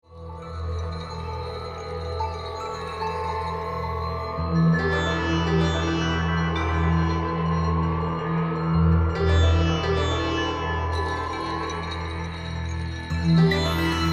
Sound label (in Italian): Spa Music Relax Evoluzione Etnica